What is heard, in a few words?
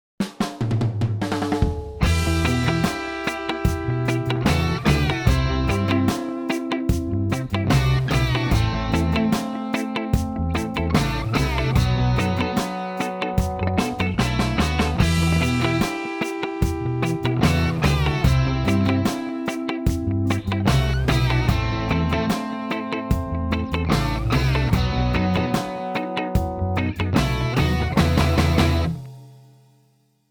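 rhythm only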